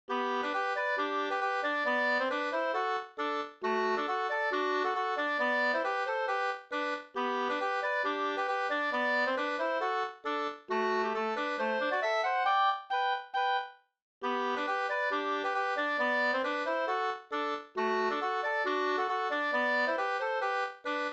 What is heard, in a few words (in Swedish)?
schottis; e Oskar Eriksson pa Sve Jm 2st